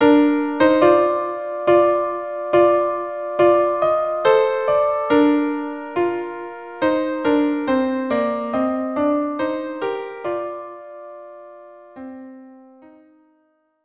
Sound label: violin duo, traditional Jewish wedding song; Mi Bon Siach (violin duo)